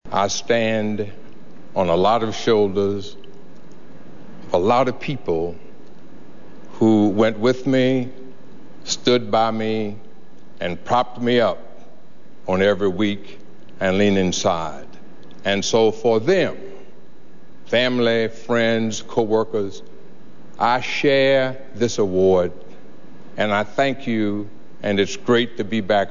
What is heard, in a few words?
trumpet